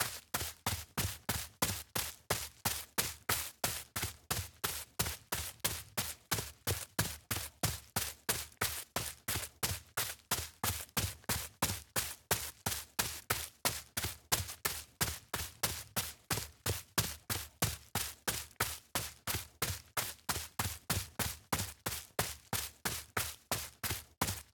foley footsteps grass sneaker walk fast run jog close; sonniss gdc